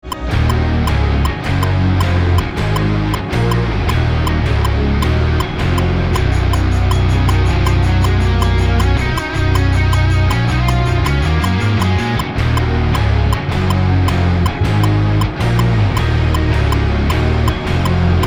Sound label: drum playthrough snippet